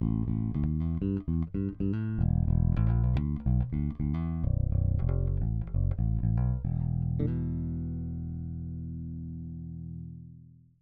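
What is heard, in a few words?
Split Jazz Passive Middle, 5-String Pickup; Fralin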